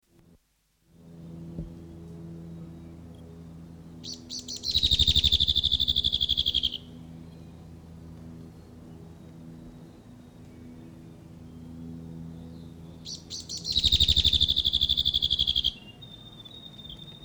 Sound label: whitehead territorial call male; conservation, native animals, birds, bird song